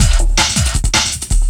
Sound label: LOOP, 160 BPM, Drum & Bass